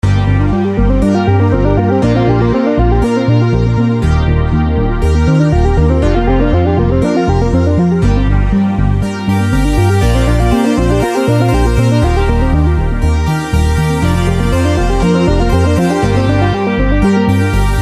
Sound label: samsung s7 ringtone; strange things